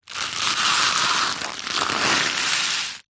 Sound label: foley leaf dry crushed scrape concrete